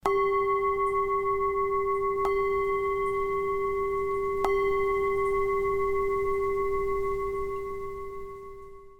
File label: Gong C5